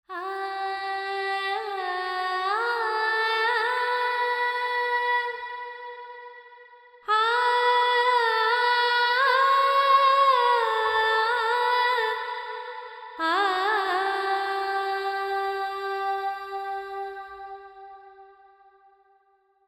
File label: FX 4-4 G 100, Vocals Female